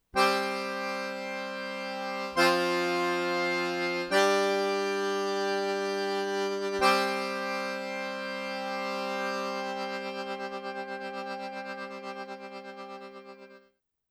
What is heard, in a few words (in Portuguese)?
Acordeon Arrocha Simplificado